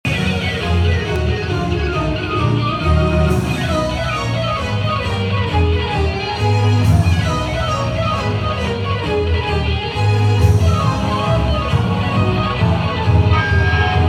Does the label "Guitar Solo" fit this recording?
Yes